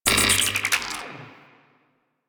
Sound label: Puzzle Fail UI; Kahl Quest; Warframe; Quests; Veilbreaker